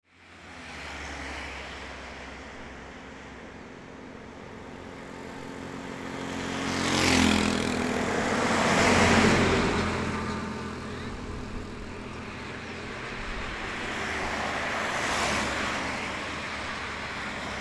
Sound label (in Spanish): ruta 9 garita, Funes